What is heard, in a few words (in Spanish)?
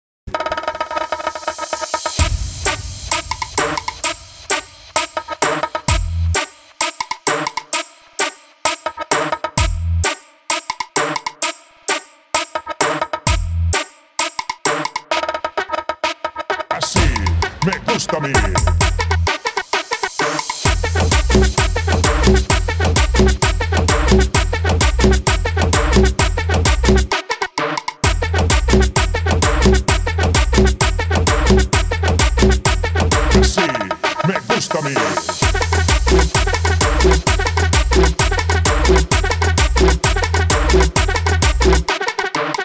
guaracha 130 BPM